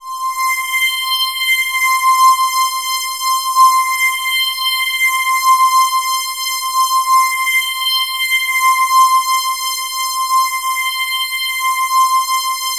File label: C5, Korg MS-2000; Synthworx